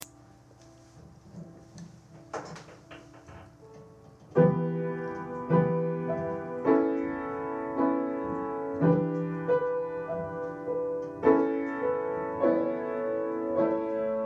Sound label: sad song, piano